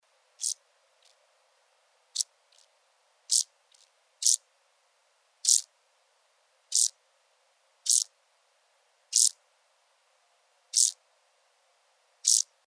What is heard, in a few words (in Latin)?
Chorthippus brunneus; BIOAC ORTH